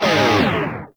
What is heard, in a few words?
Proj Small Fire; Warframe; Lizzie